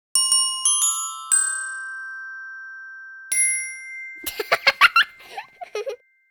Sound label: JINGLE